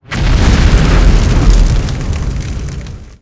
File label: Fire hit